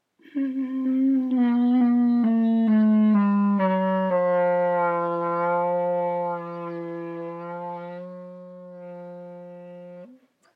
trumpet emb right hand chrom down

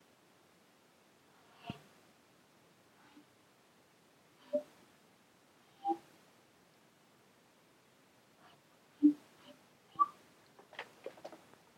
tonguerams amplified

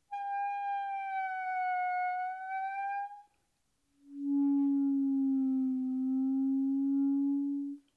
bending pitches

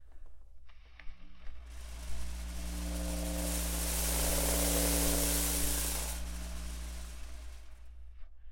foil flutter

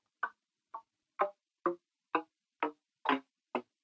d major descending

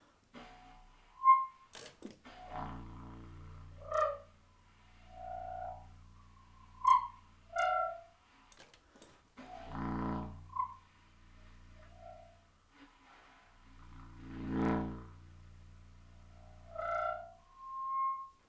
contra whispers

